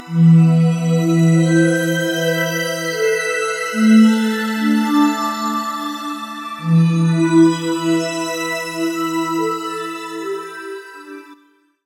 Forest FM Synth

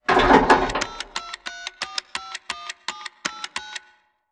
Payphone Dial; Prison Architect

